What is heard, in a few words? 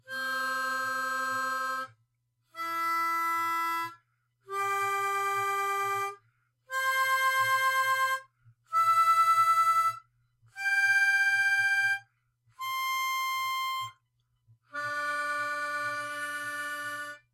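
Octave Notes, harmonica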